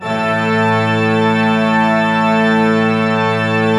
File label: A2, Cathedral Organ; ILIO; Propeller Islands Cathedral Organ